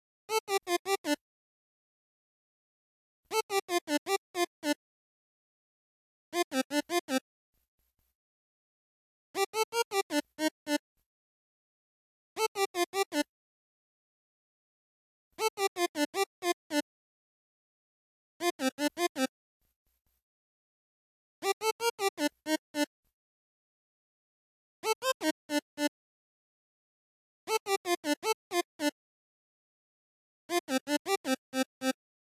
Melody; chippy

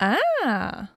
Female ahh; Blastmorph Pinball; xbox series xs

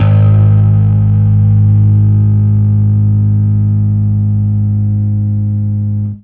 Fuzz G sharp 1

